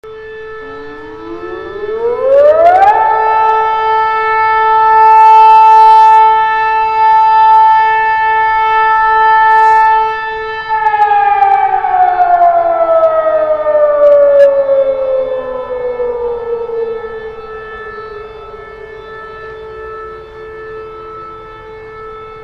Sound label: Iransiren, آژیر الکترونیکی هوشمند; آژیر الکترونیکی هوشمند مدل iransiren he-m0323